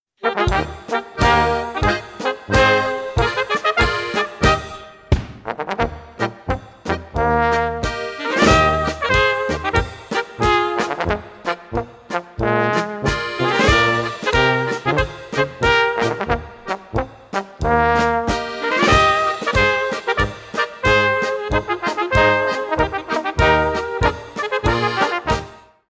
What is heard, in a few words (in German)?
Boarisch